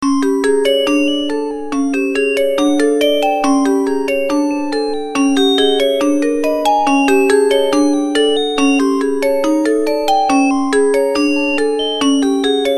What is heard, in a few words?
Magic, Effects Collection; Effetti Sonori; IDYLLIUM